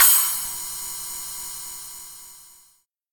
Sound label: cymbal crash sound b key; cymbal drum samples